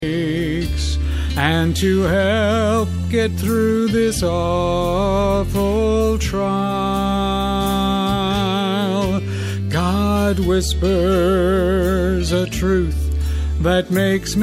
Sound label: female